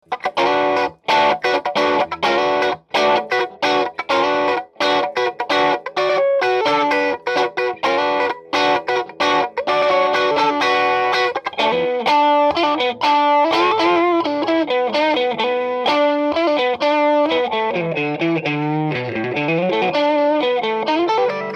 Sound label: strato half